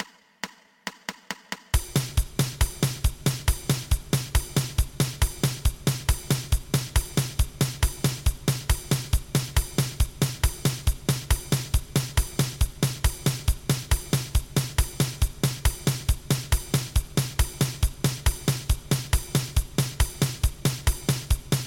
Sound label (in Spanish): 2 pulsos